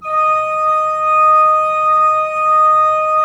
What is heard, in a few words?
3D Pipe Mix Celeste, 3D Organs; E-MU Producer Series Vol. 5 – 3-D Audio Collection